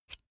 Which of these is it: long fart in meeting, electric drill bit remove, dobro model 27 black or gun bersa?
electric drill bit remove